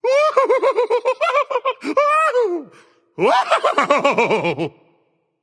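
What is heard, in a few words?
non verbal fear, voice; cernunnos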